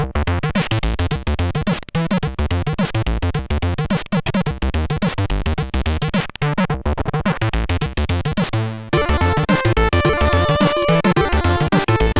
sid, MOS6581R3

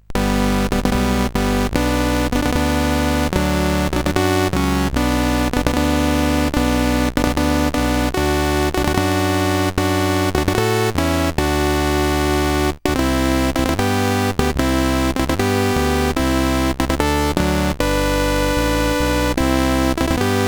sid CSG8580R5; Rescue on Fractalus

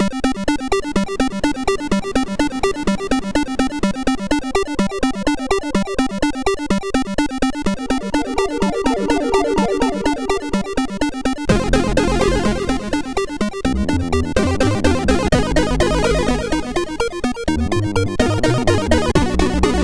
Robot Jet Action, MOS6581R4

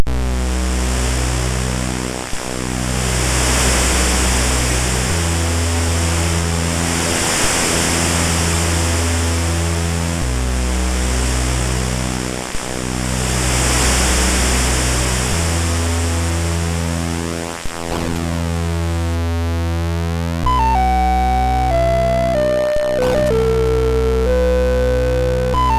First Shower tune,sid MOS6581R2

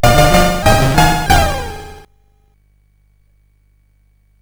sid CSG8580R5; Astrobot II

